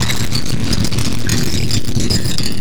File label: FX Loop, Drums & Drones